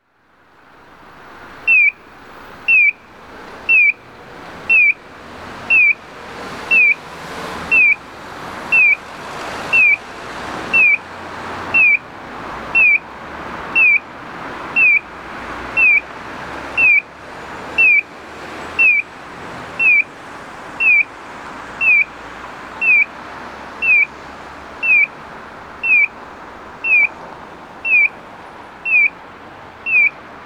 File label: piyo, onkyo kosaten; kyosan LTZ-CBSI-B; okayama naka kyokutocho